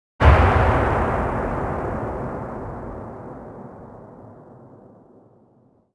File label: expl mid tail, explosion; World of Tanks Blitz